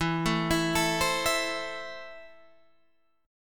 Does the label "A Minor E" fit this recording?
Yes